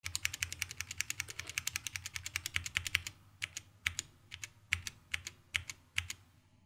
Gateron Switches Sound Test Red Blue Brown Yellow White Black Green